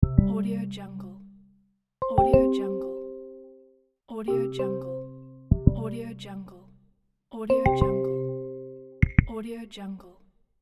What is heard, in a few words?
interface sounds and buttons; mediasoundworks